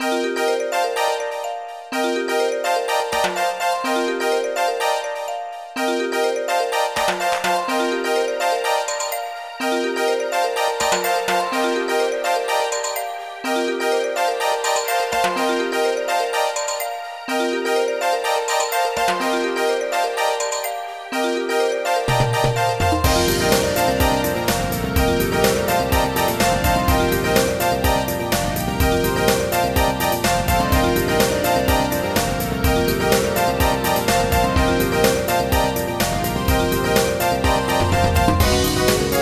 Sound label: STACCATO